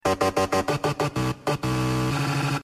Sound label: notification ring